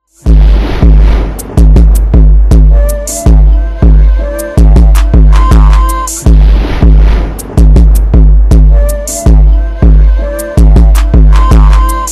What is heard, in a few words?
instrumental